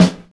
natural acoustic snare sample f key, steel snare drum sample tuned to the F; snare-drum-samples